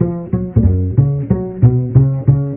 bass

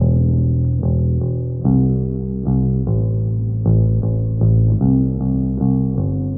bass, Loops

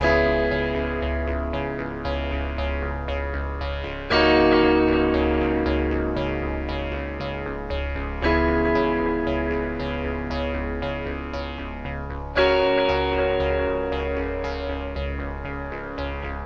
keys and guitar whistle stop; Loops; Phonky

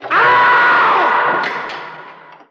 scream

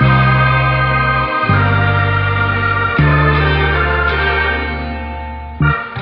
keys

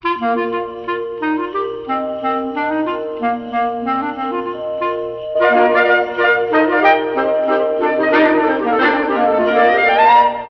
sax jaunty melody; Loops; Phonky

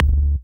dick rocker, Bass

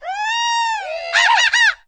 woo ahahah monkey; ooh ahh; ho hoo woo

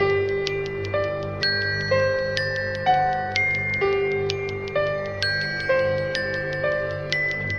keys and piano; loops; Phonky

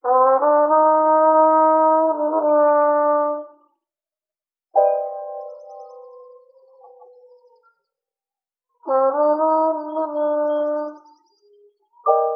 horna and piano and birds

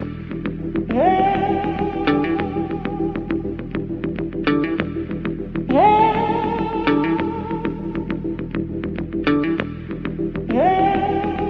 voice and basss yeah; Loops; Phonky

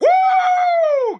woo

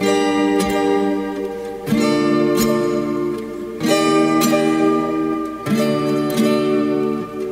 guitar

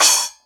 Real Closed Hats

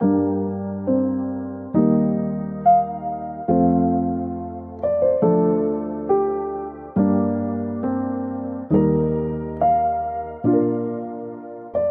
piano, Sad Loops

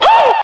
"hoo", ooh ahh; Voice

